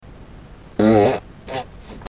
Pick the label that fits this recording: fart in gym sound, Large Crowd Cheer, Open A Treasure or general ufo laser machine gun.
fart in gym sound